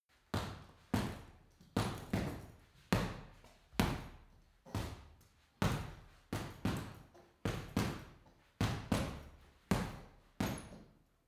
boxing punching bag boxing sequence reverberant short; sport-freizeit; boxen